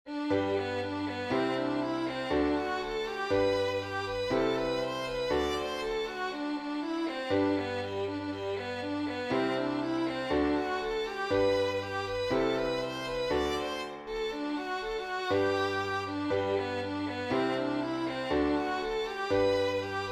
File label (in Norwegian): reinlender